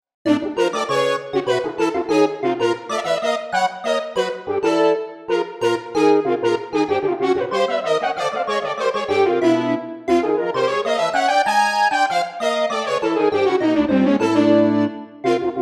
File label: ewi Voicing test